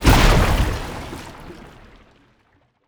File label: Water Explosions Explosion; Magic; David Dumais Audio; Magic Sound FX Pack 2